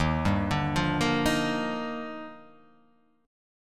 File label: Eb Major 9th; arpeggiated; x,x,1,0,2,0,0,0; EbM9